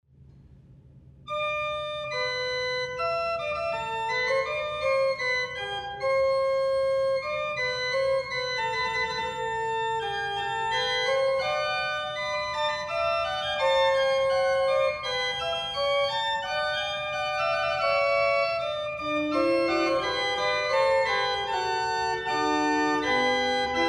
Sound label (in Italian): organo